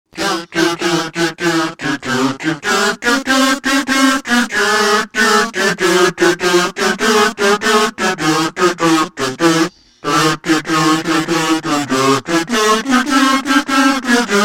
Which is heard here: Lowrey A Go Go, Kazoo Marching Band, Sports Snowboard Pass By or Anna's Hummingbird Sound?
Kazoo Marching Band